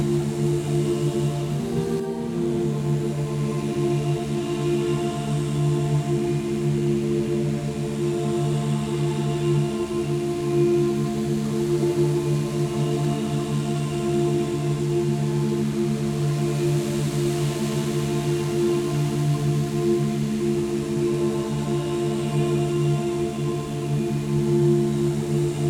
Secret Room Ambiance

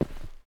Step Stone Floor

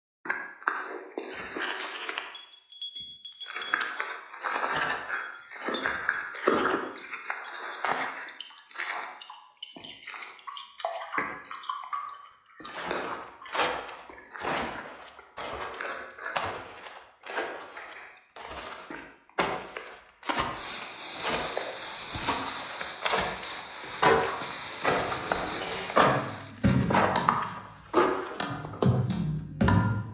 YellowShark Music

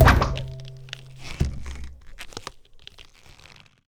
Slurper Catch

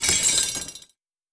Chain Swing Small

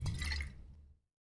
Equip Bottle

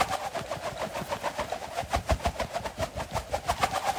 Rope Spool

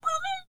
Status Sleepy

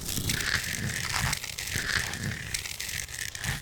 Concrete Slide

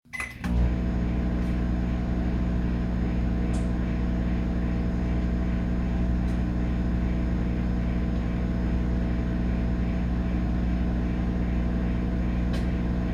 Microwave; The Salesman

